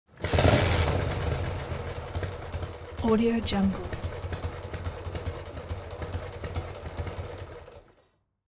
transportation motorcycle harley engine kickstarter on off